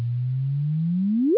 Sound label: chirp 1300Hz